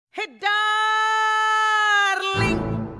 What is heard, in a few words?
Sms